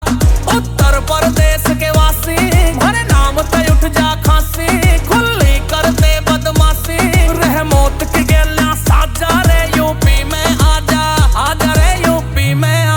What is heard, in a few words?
Ringtone